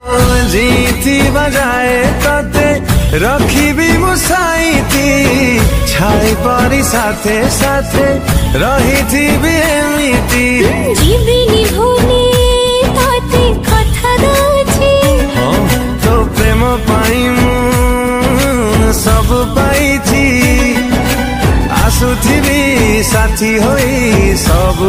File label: Odia Love Song Ringtone